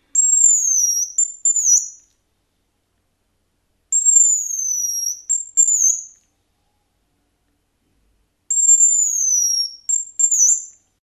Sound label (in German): Alter Hahn